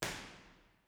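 soundfield, impulse response, St Patricks church Patrington; b-format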